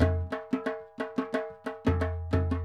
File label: Gabra Dholki Loops